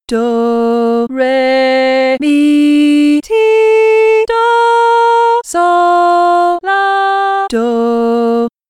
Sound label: Medium Melody Solfa